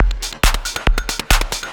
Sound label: percussion loops, Techno, 138